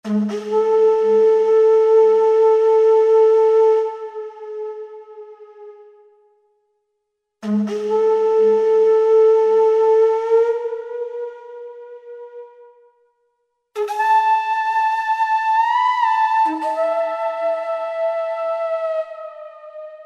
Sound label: Shell echo; World; Roland SR-JV80-05